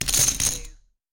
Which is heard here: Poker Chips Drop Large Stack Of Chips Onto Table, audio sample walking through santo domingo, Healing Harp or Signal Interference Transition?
Poker Chips Drop Large Stack Of Chips Onto Table